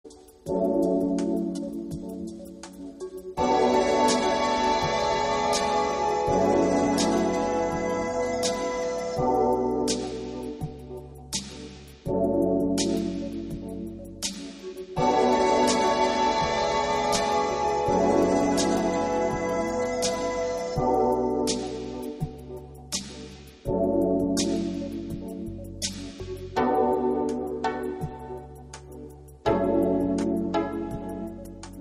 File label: Taiko